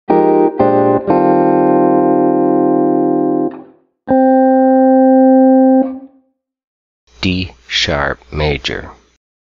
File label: D Sharp 69 S11 Guitar; jazz guitar ear training; key note recognition